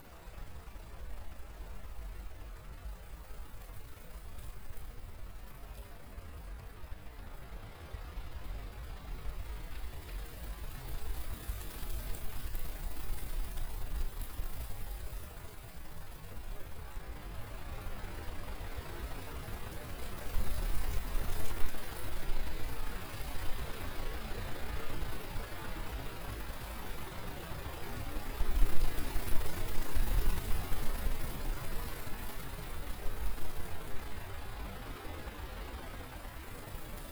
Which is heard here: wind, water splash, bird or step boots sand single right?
wind